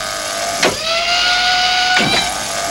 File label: HYDRO DOOR; Northstar; The Wizard VOL-1